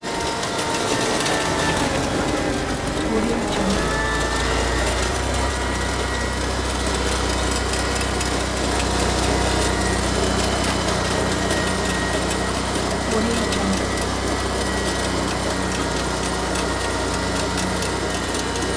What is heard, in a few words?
zamboni ice surfacer